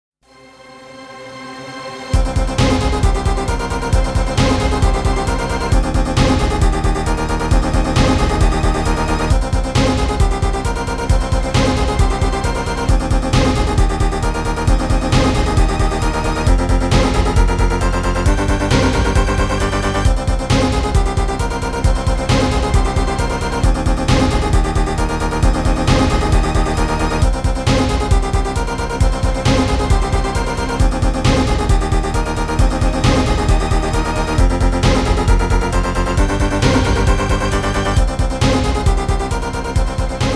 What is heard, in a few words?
thrill theme - suspense